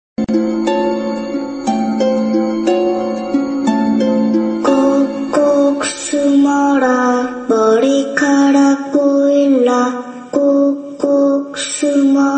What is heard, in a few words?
hide and seek song; squid game